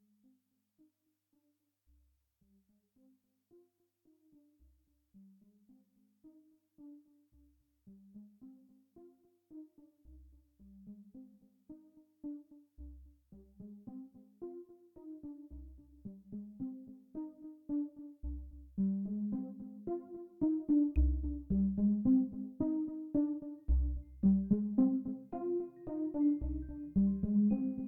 Synths

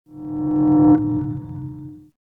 Beam wall open

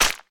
Disc Rico Stone; Boomerang Fu

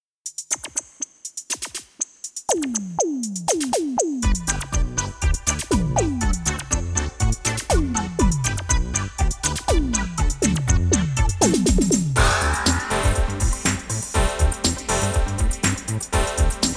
Electronic Fill; pop'n music 1

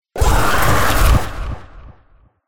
Jumpscare

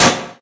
hit metal

